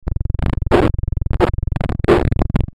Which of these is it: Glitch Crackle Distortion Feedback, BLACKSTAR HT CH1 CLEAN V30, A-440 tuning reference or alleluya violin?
Glitch Crackle Distortion Feedback